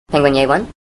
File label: penguin yay